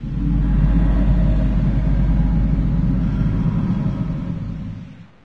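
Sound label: gust interior, ambient atmosphere; video games; source engine; portal2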